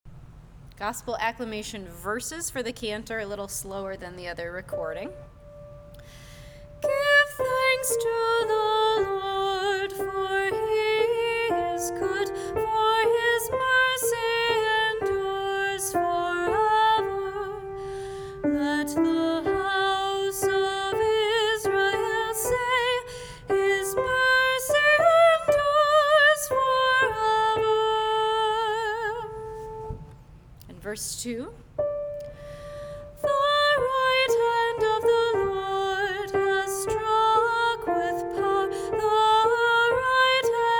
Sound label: Gosp Accl Easter verses Cantor Slower